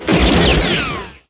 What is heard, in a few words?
bazuuka; gfactory; weapons